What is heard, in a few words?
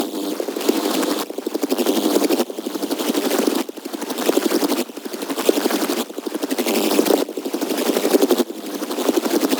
100 Perc Stumbler; Zero-G; Future Wave